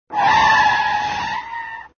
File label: skid; monkey taxi